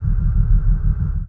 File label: starbase die fade amb; Sid Meier's Civilization 4 Complete; Beyond the Sword; Final Frontier; Units